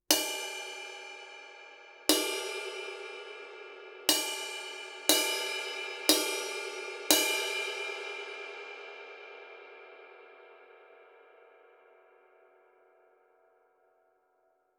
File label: 22 Medium Low Bell, 22" Kerope Medium Low Ride; ride cymbal